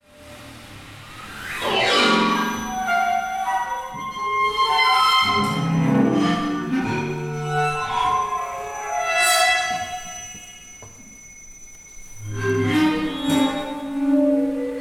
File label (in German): klingelspiel